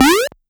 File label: Game Sound